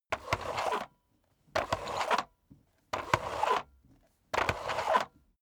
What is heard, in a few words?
vacuum sweep back sequence